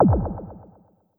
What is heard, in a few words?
cancel